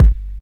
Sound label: deep kickdrum g sharp key, kick tuned to the G# note. Loudest frequency: 128Hz